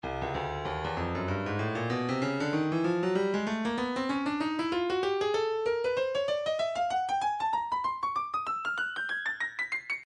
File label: stylus breakbeat midi 096 bpm